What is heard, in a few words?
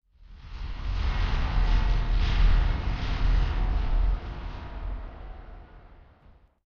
Rumble Local